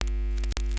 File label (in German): KNISTER; Xsample VOL-9; NOISE